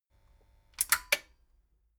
metal button stereo click power off fast

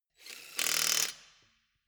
impact wrench screw fast single

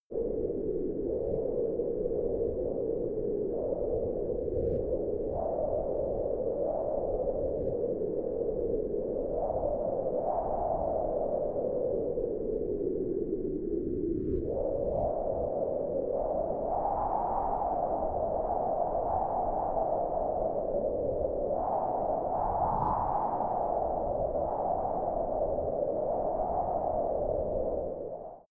wind howl interior

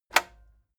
cassette eject release heavy

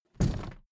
suitcase drop

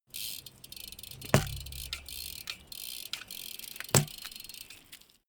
bmx maneuver free lift tire

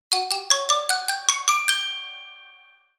upstairs bell

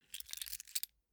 key ring large place soft

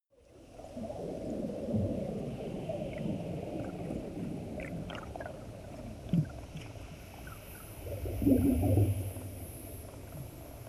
water fx

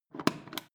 foot switch turn on off

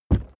suitcase hit case rattle

